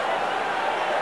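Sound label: crowd ambience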